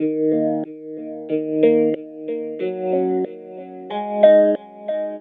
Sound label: GTR; USB Soundscan vol.47; AKAI; Hip-Hop & R&B